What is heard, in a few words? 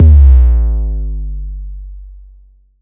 Not Nice 808's